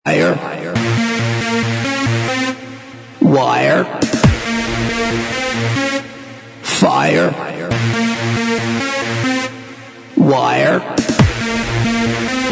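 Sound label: instrumental, backing tracks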